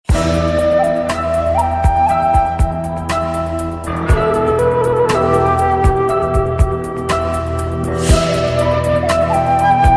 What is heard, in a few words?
flute tone, jazz; ringtones